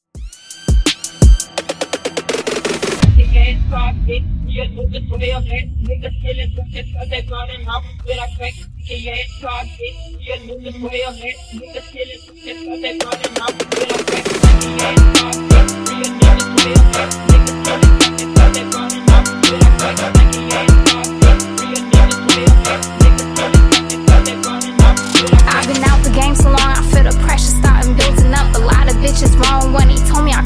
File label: Slam Intro Break Outro 83 Bpm